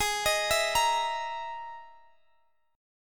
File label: E Major Sharp 11th